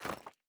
reel spin end; netent

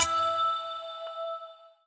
short count up end; netent

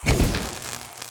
betline Start Explosion; netent

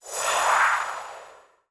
Disappearing Clothes; free Spin Griffin; netent

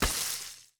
reel bounce; netent

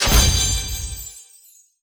butter Land; netent

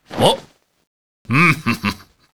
red panda anim hunker; netent

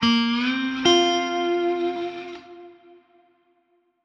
small win riff; netent

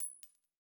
count up big win; netent

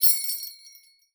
button Auto Play; netent

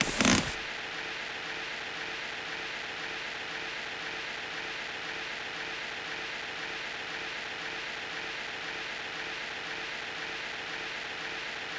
reel Spin

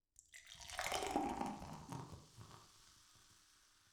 beat beer pouring; netent

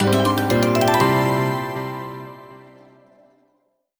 large Win; netent

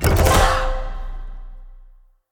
scatter win

